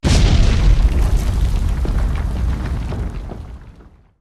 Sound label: Groundshaker